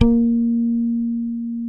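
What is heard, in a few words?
Sadowsky 5 MUTE A#3, Bass; Sampleheads; Will Lee Bass Library; SADWSKY5MUTE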